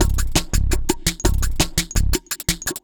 Beat, Drum N Bass; Beats; Sonic Foundry (Sony Creative Software); Knutrix Drum & Bass

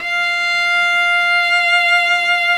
F4 SOLO CELLO

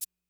Maraca Accent, 808

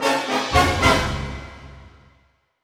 Orchestral Loops; 128 VERTIGO

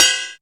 CUP CYMBAL; Zero-G; Funky Elements

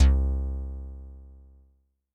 Sy Bass L; Yamaha DTXpress-II

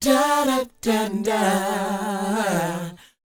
DOWOP D; ILIO; Vocal Planet

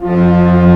Organ, Big Barton Pipes

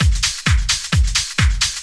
drum 130 trance

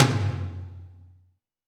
Tom Lo Can, Alesis D4

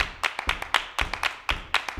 120 CLAPS

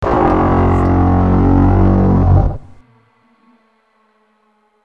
DYING COW, Synth FX Misc

